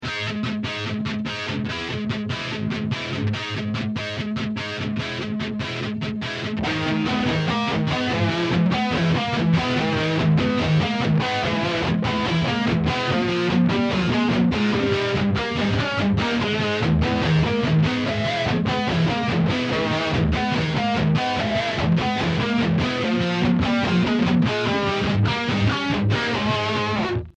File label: Hot Line